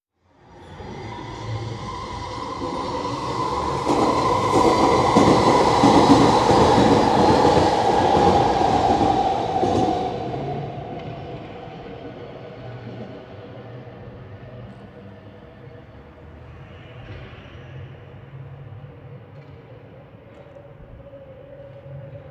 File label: Vancouver Noise mono shotgun